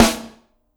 Snr, drum

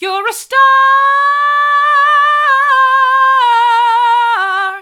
vocal

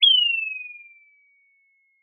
Sound of an owl

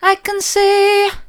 i can see soft, vocal

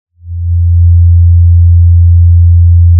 Sound of a cruise ship horn

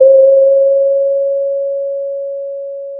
pop, Sound of a doorbell

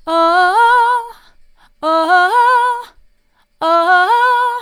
oh oh oh mid, vocal

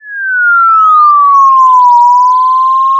Sound of a police car siren

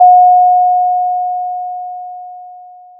Sound of a doorbell

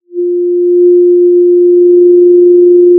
pop, Sound of a cruise ship horn

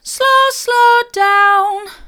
slow slow down mid, vocal

